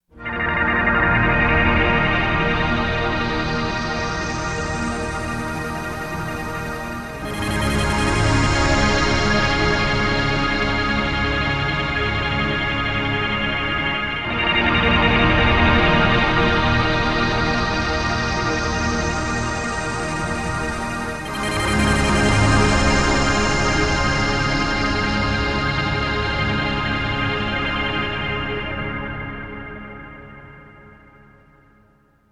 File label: k4 underwater